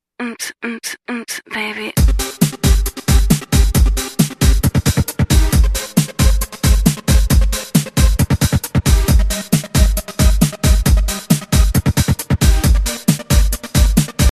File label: Ringtone